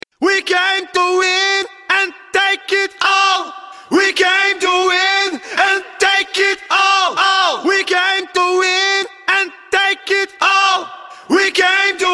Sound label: rock 130 Gm, Acapellas